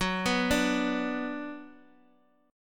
F sharp Suspended 4th Sharp 5th, F sharp, Guitar, Standard; arpeggiated; x,x,4,4,3,x; down